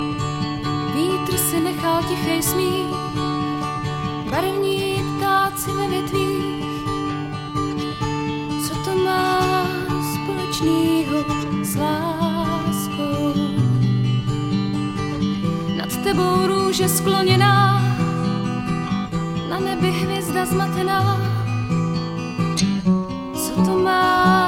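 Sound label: Love Song Czech Language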